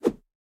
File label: Swing Large